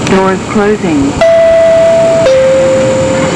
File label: doors closing